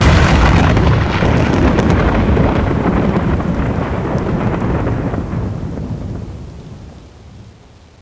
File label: thunder; ambience